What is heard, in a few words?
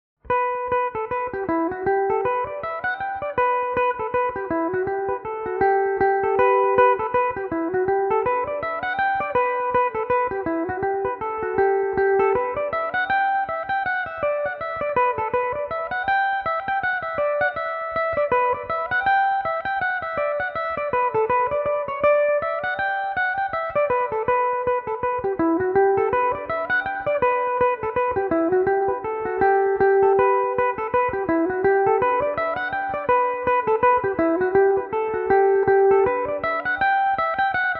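E dorian, irish mandolin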